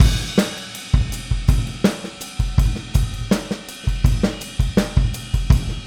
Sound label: Boogaloo Chorus Full Mix, drums, 82 BPM; Drums from the Big Room The Mixes